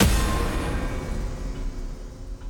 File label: Golem; Legend of Keepers